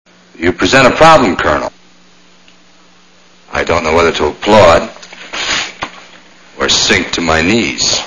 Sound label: applaud